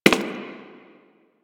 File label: Human Loid Foley Step; Warframe